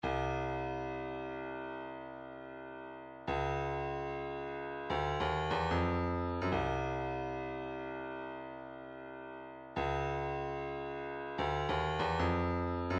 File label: 148 Cymbal, midi; Frenetic